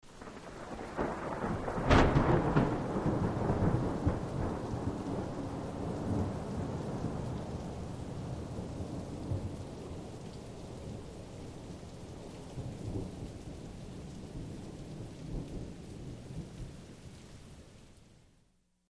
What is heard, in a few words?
Rolling Thunder